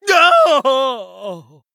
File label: Male Blood Elf Death